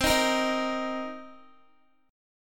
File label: C Minor 7th C Guitar; Cm7 strummed; x,15,13,15,15,x